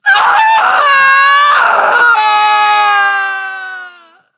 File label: fear; smite; morganlefay